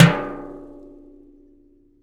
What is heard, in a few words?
hit, rust kit; Nucleus Soundlab; Ambient Drums